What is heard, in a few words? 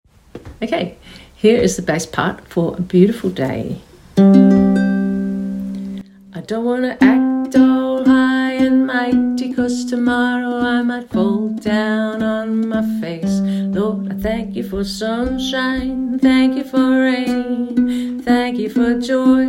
Bass harmony; witchyukes